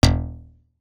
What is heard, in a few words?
BUZ; Masterbits - Total Zone